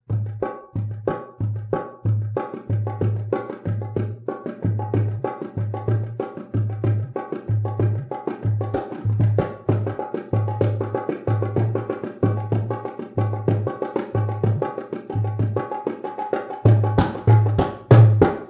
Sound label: dholak